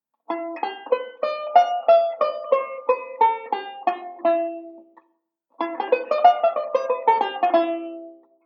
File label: Modal Sequence; Beyond Chord Melody Volume II